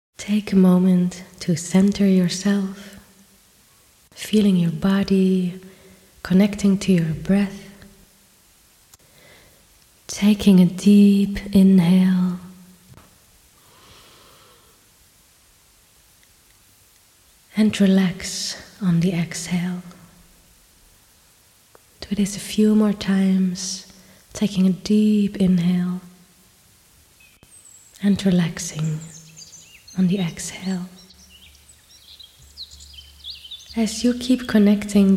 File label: healing forest meditation